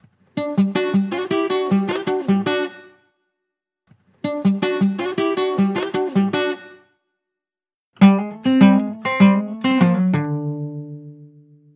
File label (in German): gitarre